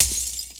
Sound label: Ice Ball Enemy Break; New Super Mario Bros. Wii